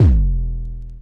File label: Sub37 Sub Bend Vinyl Synths C3; vinyl perc